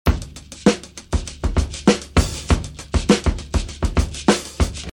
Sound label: rock bed